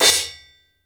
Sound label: CHOKE, cymbals; Sampleheads - New York City Drumworks VOL-1; SW CYMBALS